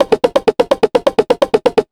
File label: 127 DRM, Fresh Disco House